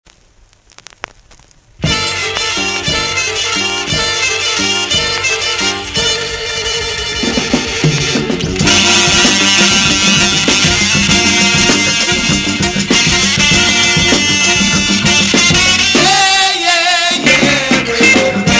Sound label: Ringing Bells Sweet Music